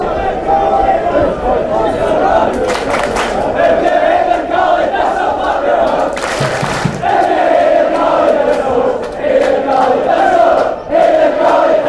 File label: hate caley thistle clap your hands fanchants